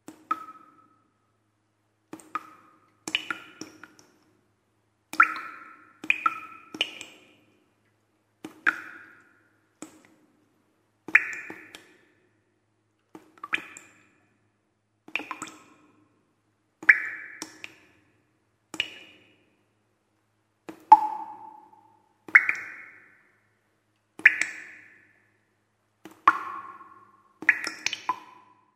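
voda kapaet v podvale, water dripping in the basement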